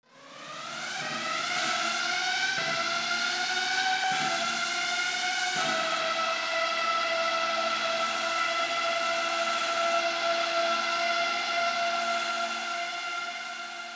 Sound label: distant on, vehicles lambo2